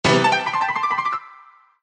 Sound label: pianist ringtone, Samsung SMS ringtones